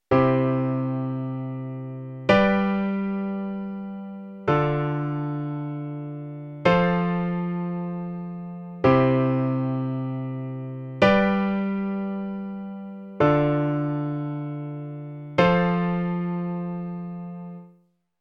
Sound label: Verse in C example